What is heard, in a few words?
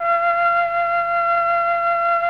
MFLT 14F4, MELLOTRN; Sonic Reality; Vintage Timetraveler